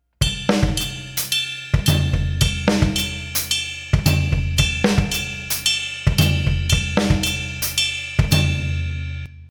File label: songol; Zen Art of Drumming samples; rudiment